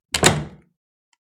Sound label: Door close